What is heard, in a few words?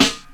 SD, dance drums hip; 90 s Sample CDs; Best Service Dance Mega Drums